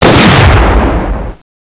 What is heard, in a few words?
kaboom